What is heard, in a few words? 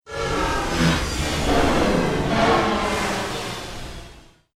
Droid Power Down, Robot Sound Effects